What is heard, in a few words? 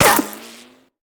Bullet Ricochet; Sound Ideas; Guns